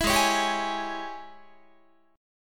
Ab Major 7th Suspended 4th Sharp 5th Ab down Guitar; AbM7sus4#5 strummed